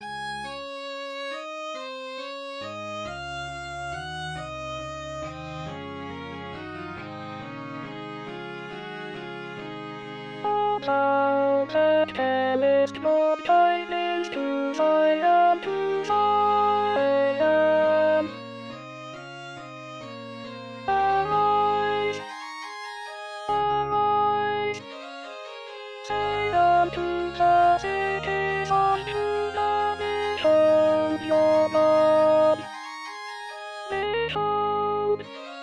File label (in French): choeur alto; haendel le messie choeur n 8